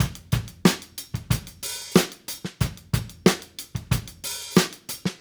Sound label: Call And Response Drums A, 70s Analog Funk; Sonic Foundry (Sony Creative Software); What it Is!